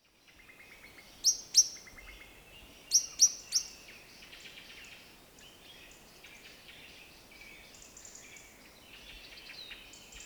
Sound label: Common Blackbird Turdus merula merula